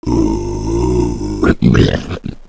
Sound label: boomer idle, zombie idle